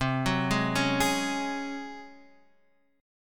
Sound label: Db Major 7th Suspended 4th C